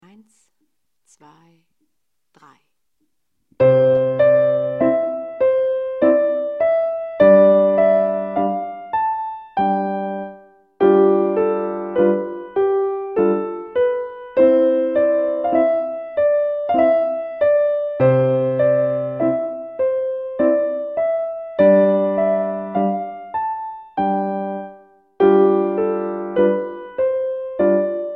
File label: mazurka, Piano; Play Piano Klassik